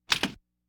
Laugh tracks

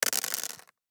DICE on Plastic, Throw and Roll, Mini, 6 Six Dice; Samples; ArticulatedSounds; DICE Sfx Party (Extended)

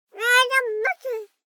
BABIES TALK Girl 12 months Hagabutte; Baby Boom

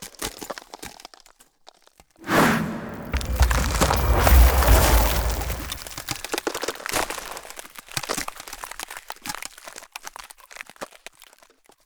Ice Spell Freezing Slowing Glacial Grip; Arctic Aura

